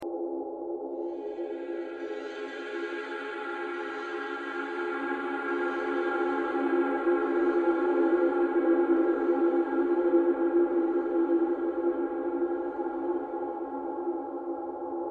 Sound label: ambient vile grove